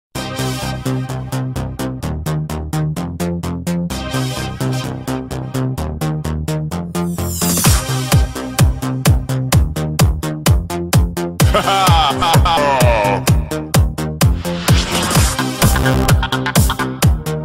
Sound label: disco party